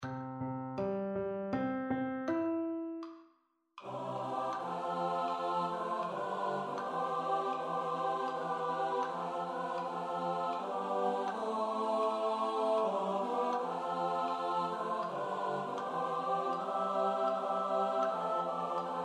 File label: SATB STUDY; Lets Start a Big Commotion